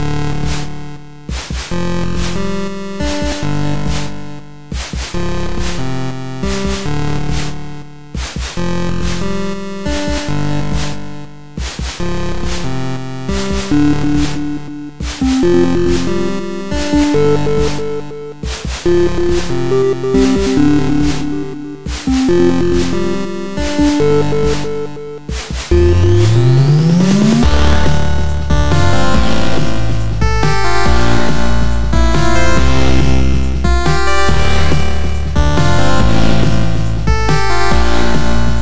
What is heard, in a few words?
Loop; Dual Blade: Battle of the Female Ninja